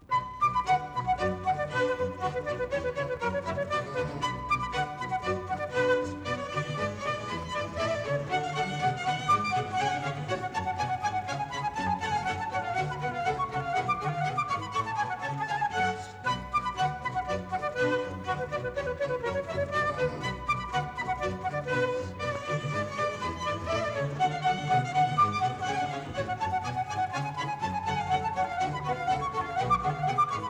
Orchestral Suite no. 2 in B minor BWV 1067 7. Badinerie